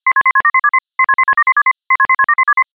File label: Minitor VI Tone